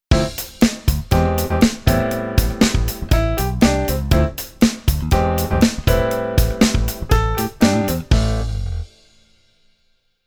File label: Keyboard Pf Funk